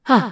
punch yah; rosalina; SM64 CO-OP

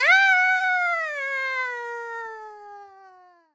waaaooow; toadette; SM64 CO-OP

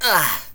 aagh; SM64 CO-OP; Junio Sonic

